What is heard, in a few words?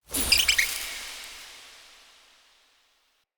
Hare Mote; Animal Motes